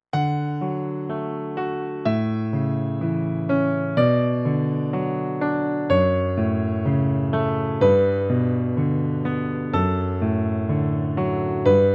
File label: Canon in D keys